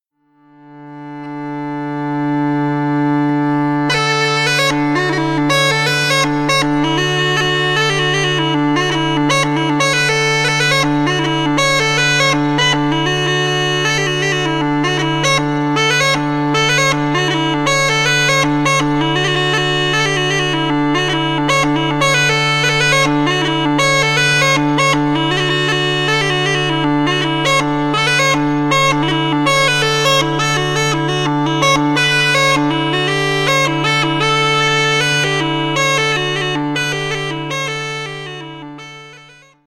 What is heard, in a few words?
hornpipe hornpipe; glamorganshire hornpipe